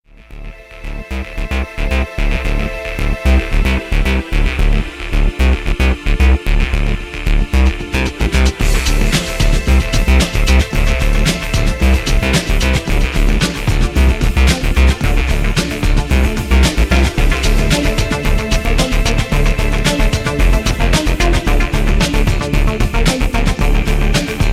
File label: Sub sound